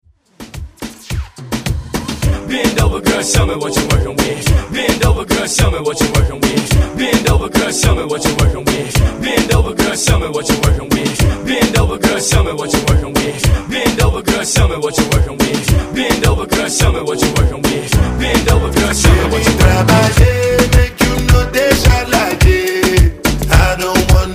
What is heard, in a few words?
Moombha Hype Redrum